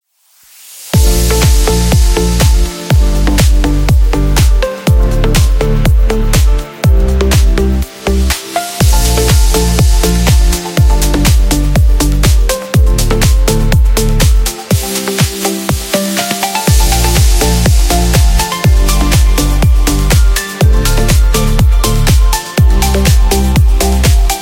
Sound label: Fashion House